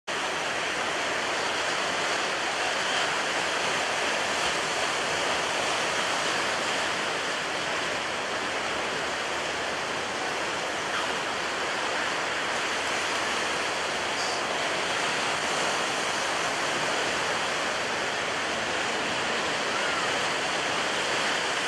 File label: rain sound; باران شدید